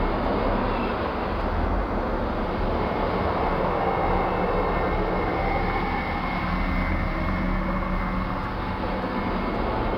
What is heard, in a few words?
Landscape Orb Crater Loop, Ambience